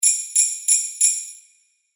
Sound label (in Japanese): ジングルベル 4連続